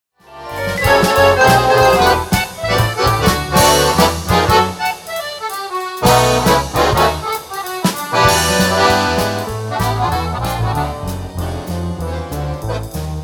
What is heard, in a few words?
accordion